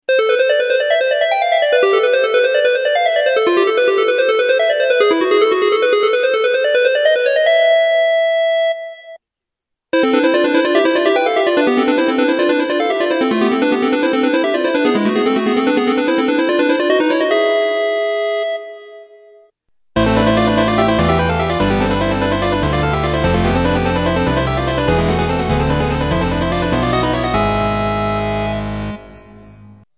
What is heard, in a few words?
duet 2 major